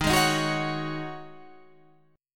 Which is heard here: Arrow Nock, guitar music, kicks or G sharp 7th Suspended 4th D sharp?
G sharp 7th Suspended 4th D sharp